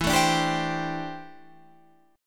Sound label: D 7th Suspended 2nd Suspended 4th E